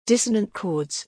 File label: dissonant chords